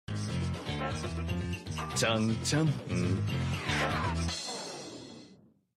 ba dum tss

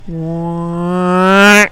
wah

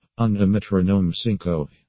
metronome; cinco

